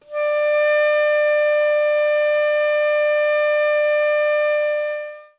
D5 half vented, flute; classical

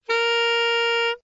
C5, soprano saxophone

